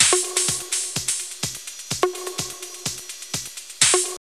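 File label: drum loop; X-Static Goldmine 3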